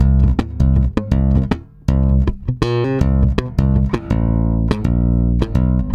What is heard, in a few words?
STRUM D, Bass; Spectrasonics; Bass Legends; 88 STRUMMIN